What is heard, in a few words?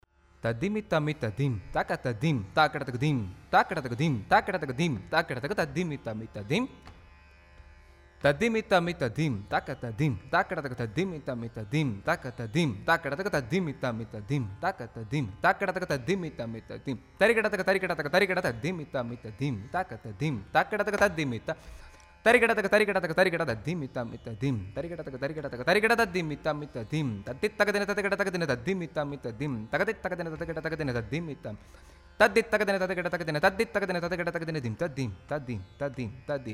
konnakol